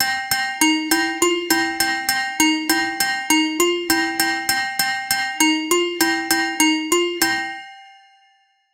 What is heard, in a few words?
song jingle